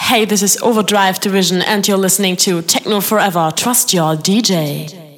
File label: Radiojingle; OverDrive Division; Dancing Nation